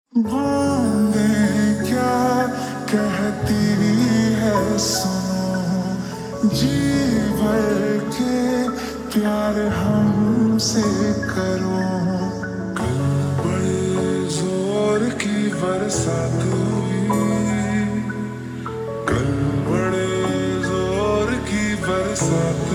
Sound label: Slow Reverb Version Ringtone